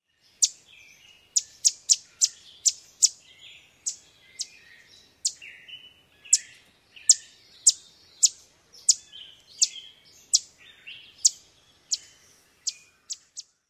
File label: alarm chips